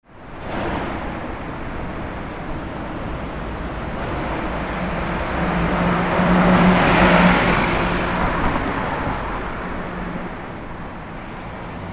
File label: Street Corner